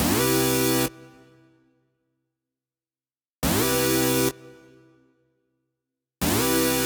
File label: Poly, trap трэп; Pansy